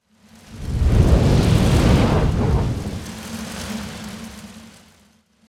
Nursery Fire

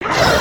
run, creatures organic

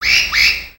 Bird Amazon Oneshots; ambience; gnarled forest

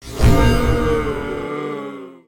Tame Fail

